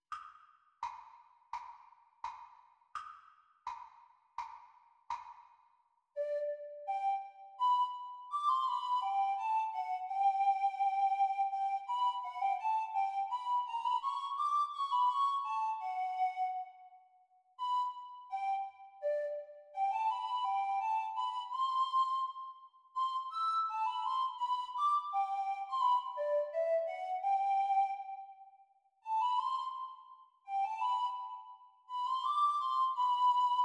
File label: Descant Recorder Performance speed